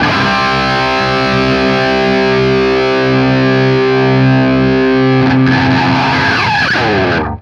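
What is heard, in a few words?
power C cut, guitar power chord; East West; Steve Stevens Guitar Samples Collection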